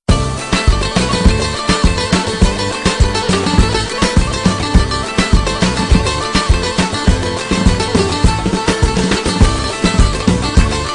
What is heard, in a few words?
Albanian Music